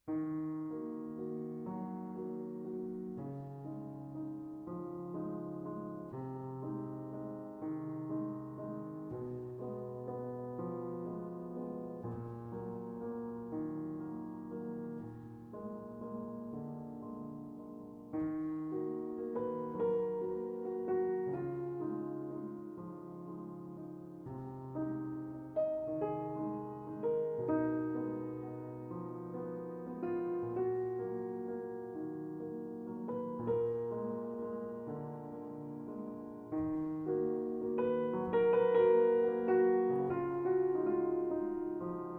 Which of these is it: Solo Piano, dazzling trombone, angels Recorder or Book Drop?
Solo Piano